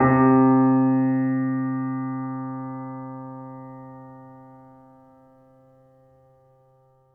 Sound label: piano p c3, Grand Piano; Sonatina Symphonic Orchestra